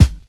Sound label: original steel kick drum sample g sharp key